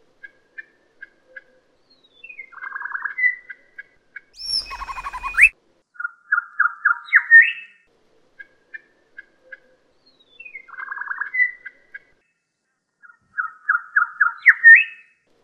suara anis bentet kelabu

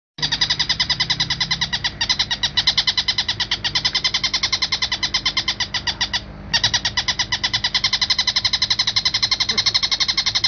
tengkek

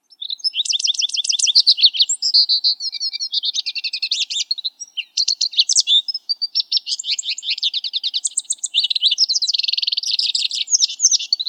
suara lesser goldfinch

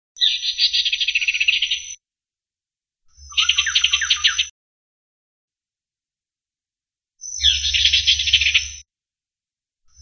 suara kicauan burung ciungair coreng kalimantan

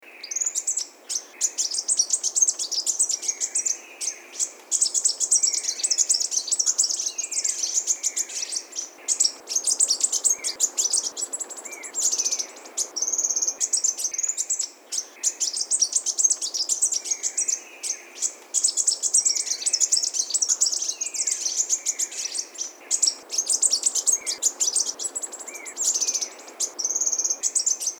suara green tailed sunbird

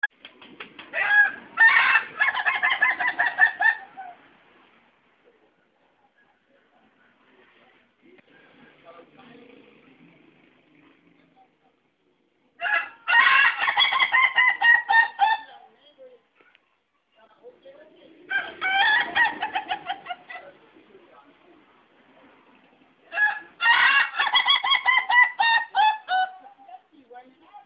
jenis bunyi ayam ketawa suara dangdut crystal

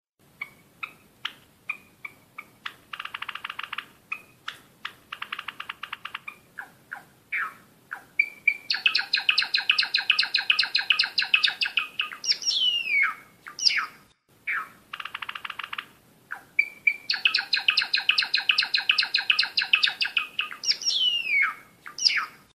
masteran gelatik jawa

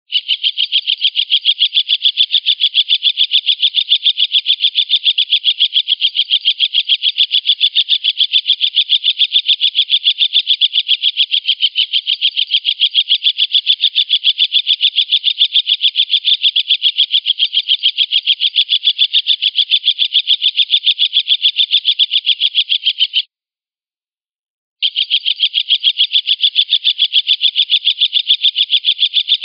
suara burung tengkek

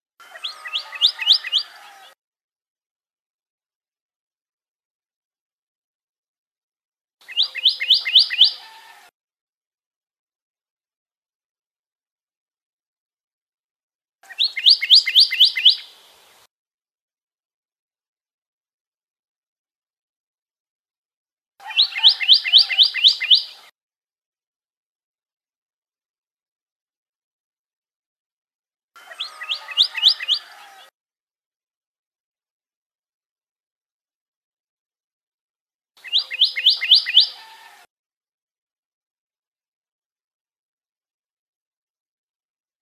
suara kicauan burung pyrrhuloxia

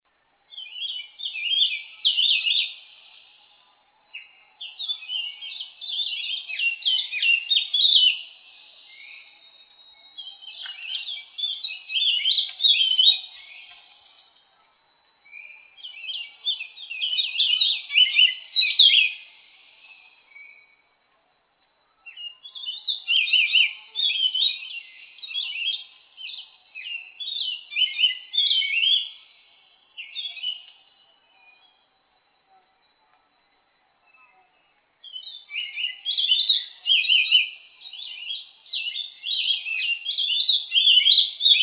cucak rumbai tungging

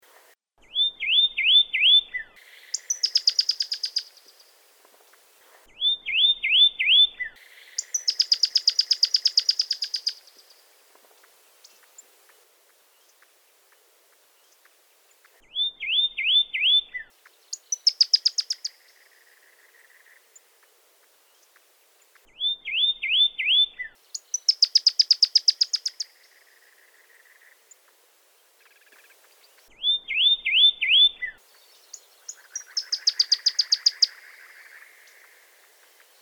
suara call song burung pyrrhuloxia

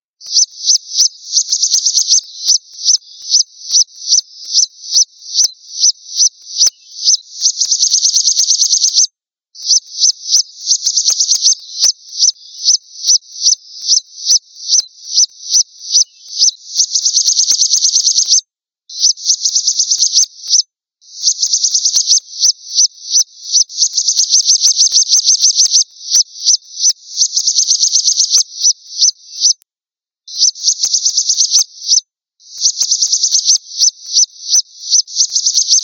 suara aneh

